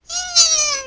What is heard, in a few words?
attack